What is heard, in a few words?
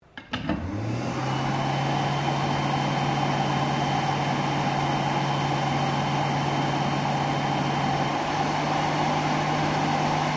DRYER HAND OPERATING, Hand blow dryer, Public Bathroom; Offices